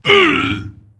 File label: Attack Light; Jaraxxus